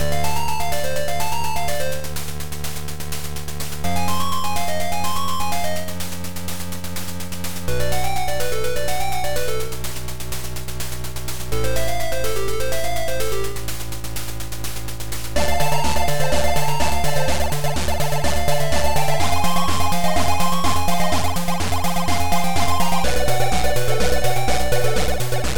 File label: Game music; Mr Slidey